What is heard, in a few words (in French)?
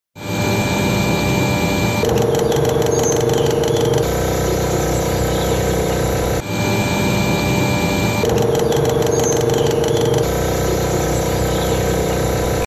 son alterne froid chaud, climatisation; Grésillement unité exterieure climatiseur Mitsubishi